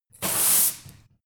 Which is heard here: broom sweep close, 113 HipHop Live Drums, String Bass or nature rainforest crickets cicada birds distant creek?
broom sweep close